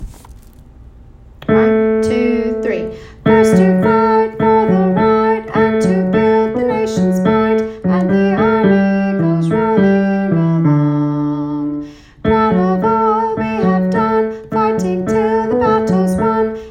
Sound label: Army tenor, Military Songs, Chorus